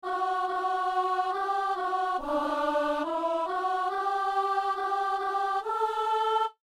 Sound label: alto; Psaume 121 (122); Messe du 17 janvier à Saint-Denis